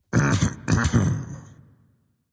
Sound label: clearthroat; smite; voicepack; xingtian